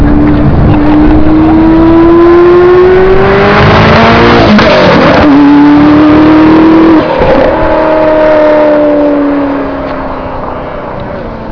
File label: f40 flyby